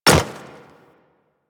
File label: Hyena Micro Step Default, Footsteps; Warframe